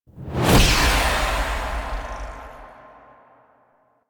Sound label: Arm Whoosh; Cinematics; Warframe; The War Within; Queens Lair